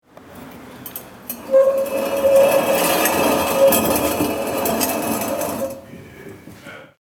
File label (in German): Metalltisch verschieben